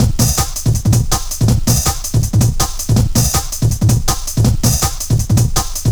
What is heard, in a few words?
Oh, Loops, breaks; m8 breaks